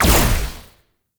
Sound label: Amp Silenced Shot; Operators; Amplifiers; Drifter; Warframe